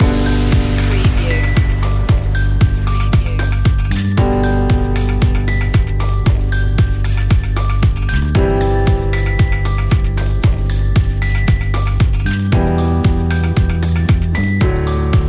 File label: storyteller loop